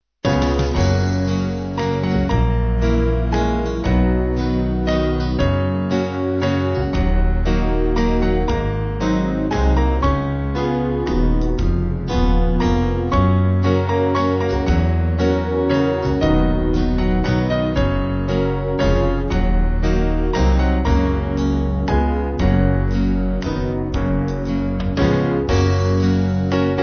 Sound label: Amazing Grace Band; Newton